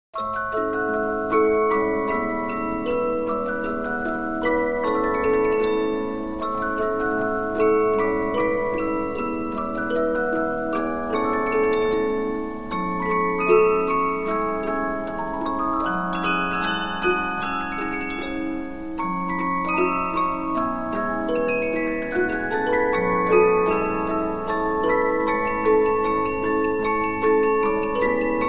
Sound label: Polyphon, 15inch disc musicbox